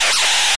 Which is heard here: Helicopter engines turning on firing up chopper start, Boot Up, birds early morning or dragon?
dragon